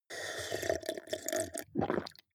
drink glass milk slurp large

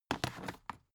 dvd case put down

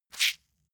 leather impact mud hard squeeze

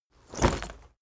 leather armor movement

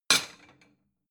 plate small shelve slide hard

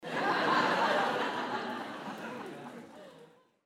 laughter tv game show

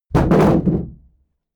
shield drop wobble

